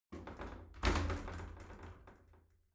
shower slide door rattle hit

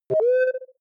click swell filter saw, signale; kommunikation

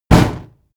fire hit; whooshes rises; hits impacts

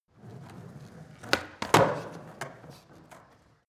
skateboard indoors drive flip kick

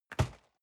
cardboard box medium place rustle; objekte

